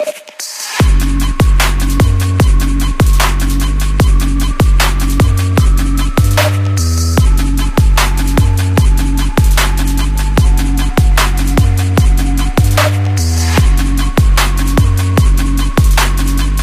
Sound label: medkitbeat; svencoop; zubben; morzibov